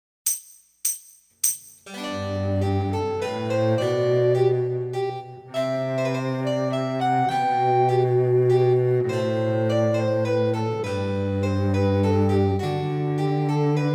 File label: Minuet In G, piano